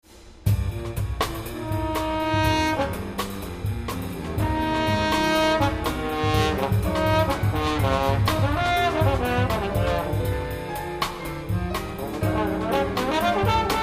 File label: Tromboning